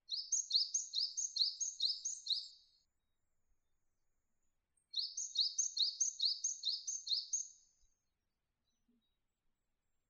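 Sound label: Japanese tit song; shijukara saezuri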